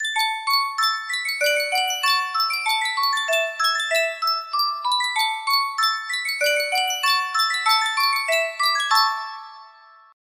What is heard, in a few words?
sankyo miniature music box